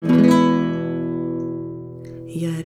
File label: Metronome